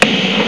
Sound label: Blast; The Ultimate Warrior 2.01